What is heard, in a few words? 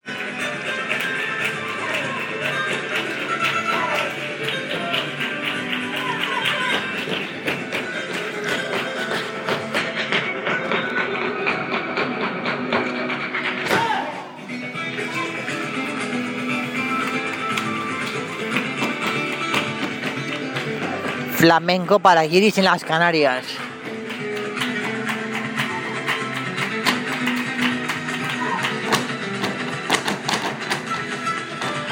flamenco show